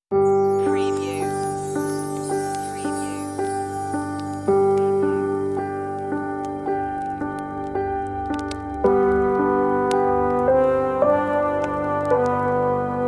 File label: In the Bleak Midwinter Relaxing Christmas, on-hold music